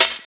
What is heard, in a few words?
SD, Drum & Bass, 160 BPM; Ueberschall; Drum & Bass Flash Trackz